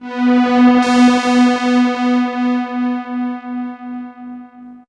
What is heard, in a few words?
Strings; Alpha Strings